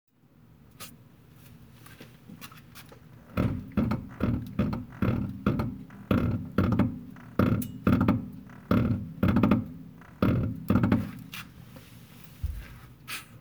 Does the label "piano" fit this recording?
No